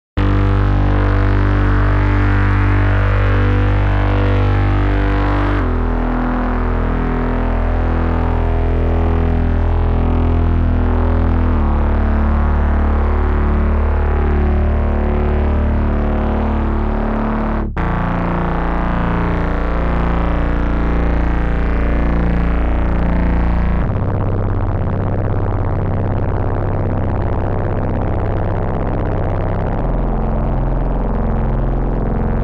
Vortex Chaos Bass; Newfangled Generate

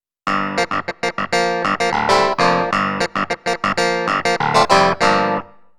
Yamaha Clav

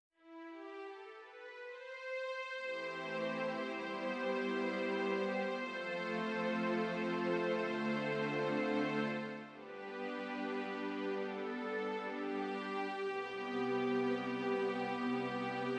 Roland RD 800 Strings

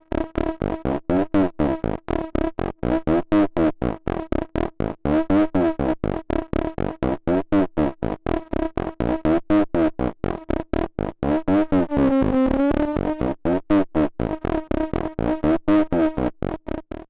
spring filter Q HI GAIN Feedback; Snazzy FX; the eternal spring filter

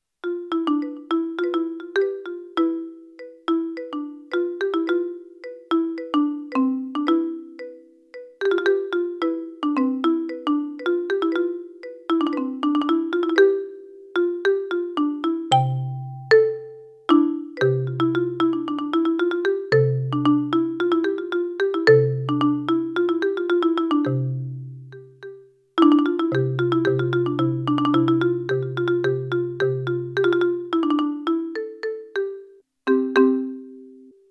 Thomann MPS 850 Kit Marimba

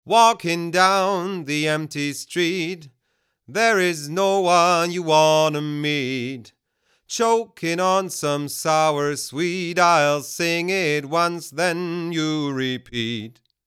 Male Vocal 21 kHz Peak 4 dB; Millennia NSEQ HF

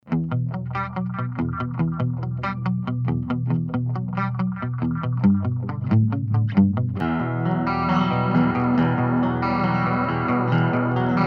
Fender Strat Line6 Spider4 SM 57 Millennia HV 35

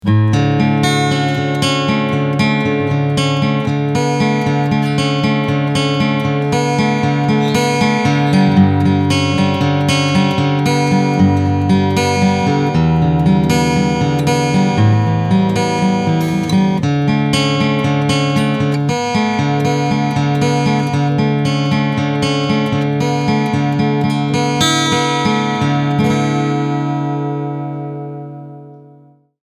Picking Mic

